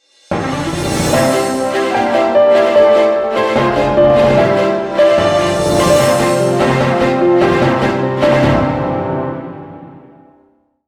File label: New World Short Version; gekiban